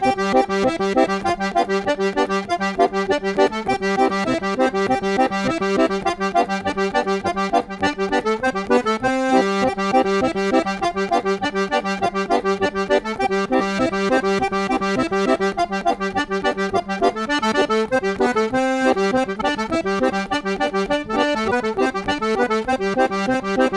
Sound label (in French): polka